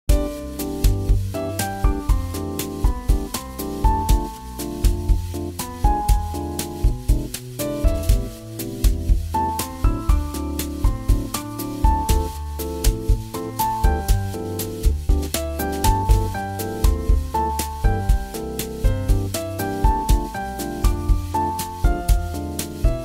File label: Bossanova